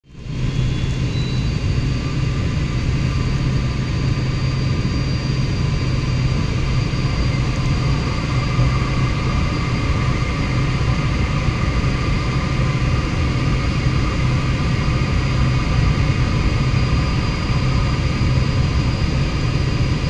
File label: airplane interior